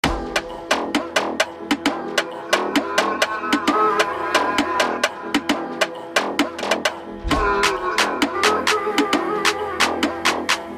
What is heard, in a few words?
beats arabi